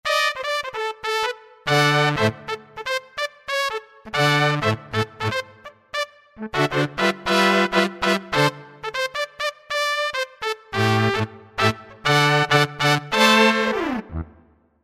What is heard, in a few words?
Cuttin Stabs, Roland V-Synth; Factory Patches